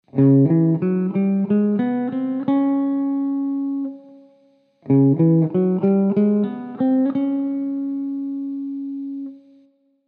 Jazz III regular tip pick vs Rock III speed beveled pick